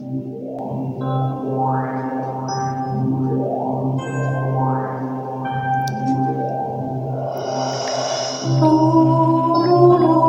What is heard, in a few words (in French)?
les 2 voix